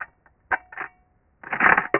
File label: Coin Insert Slide; Coin Slot